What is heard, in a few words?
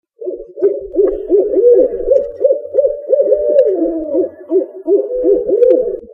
barred, owl